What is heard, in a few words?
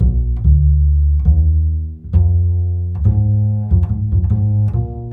bass, Loops